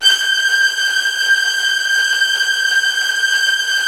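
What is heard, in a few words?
STR TREMOLO, Virtuoso Strings, G4, right; ILIO; Kirk Hunter Virtuoso Strings VOL-3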